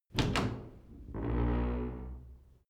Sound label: old door open